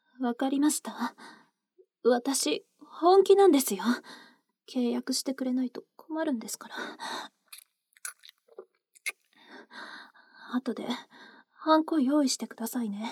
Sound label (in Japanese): 耳舐めされながらキス、耳舐め、乳首舐め、フェラまでしてもらう音声; 保険のお姉さんは親友の妻だった〜媚び媚びNTR中出し枕営業〜; KU100